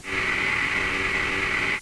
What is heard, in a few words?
Spindle Raise; Florida State Football - Ultimate Multimedia Experience; jukebox